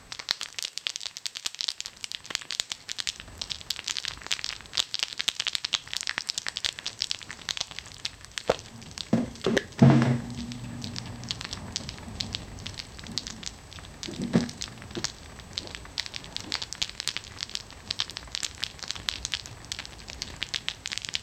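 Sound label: cartoon eating a hamburger; animated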